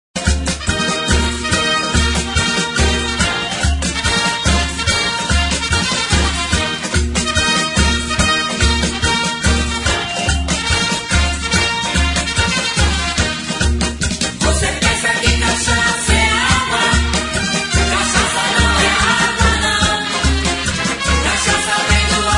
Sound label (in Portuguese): Marchinhas de Carnaval; Comemorativas